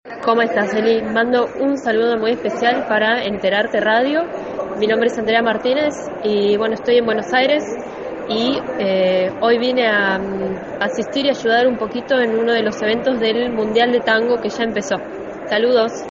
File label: TANGO